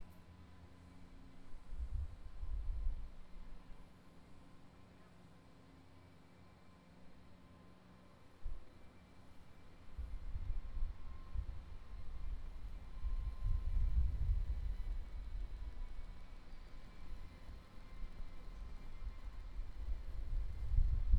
Outside Near West

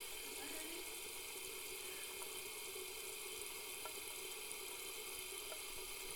Running Water Bathroom; West Hall

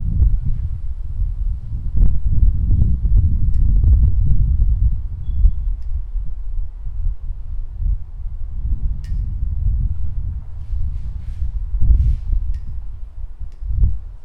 A natural sound; fieldrecordings

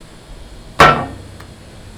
Thunk guardrail; fieldrecordings

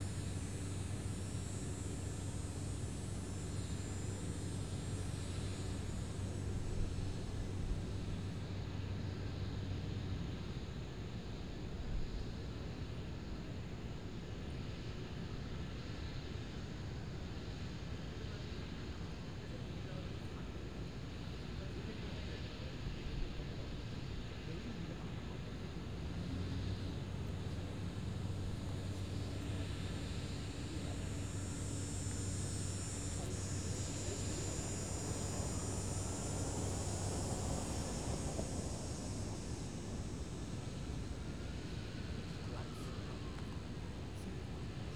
outdoor

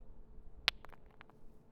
Rock On Concrete; fieldrecordings

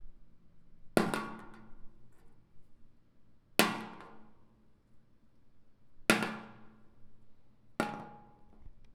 Acorn Drop On Metal; Walker Lab

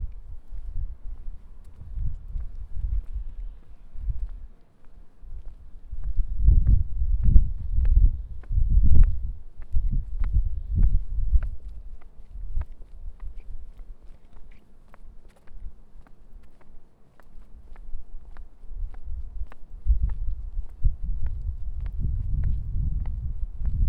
Foot Step; fieldrecordings

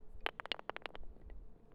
Rock On Concrete; fieldrecordings

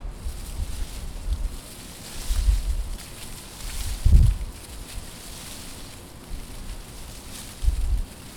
Nature leaves; fieldrecordings

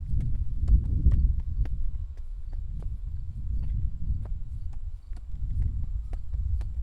windy walking up stairs

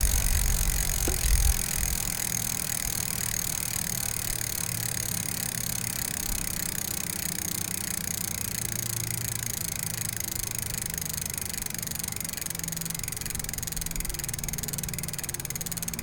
Bike; fieldrecordings

